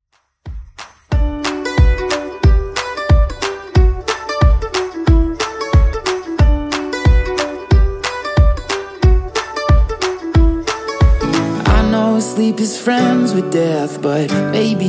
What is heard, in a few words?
Top40 Redrum 91bpm